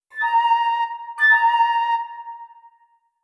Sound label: flute